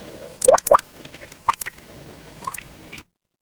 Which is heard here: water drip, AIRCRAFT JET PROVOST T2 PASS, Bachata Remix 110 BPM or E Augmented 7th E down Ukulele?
water drip